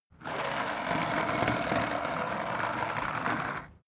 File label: skateboard indoors drive light